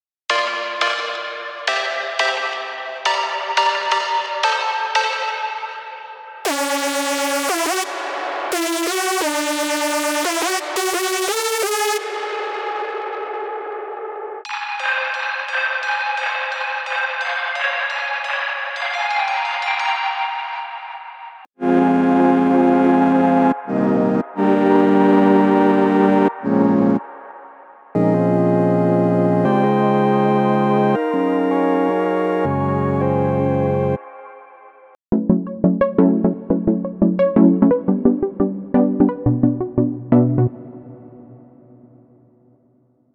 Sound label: Drum Bass